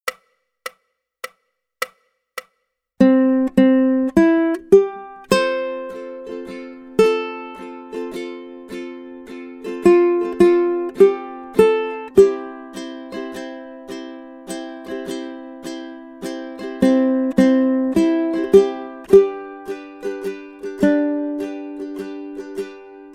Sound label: On Top of Old Smokey, ukulele